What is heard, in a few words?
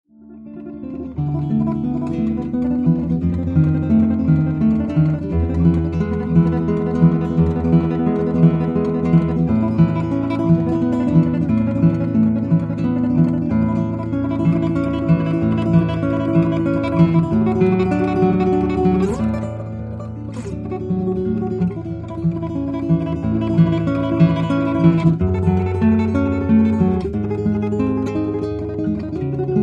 Cedar, guitars; Tarrega; Recuerdos de lAlhambra